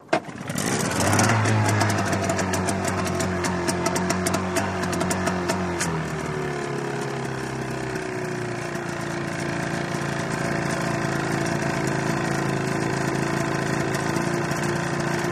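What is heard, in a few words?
20 Hp Johnson Start Loop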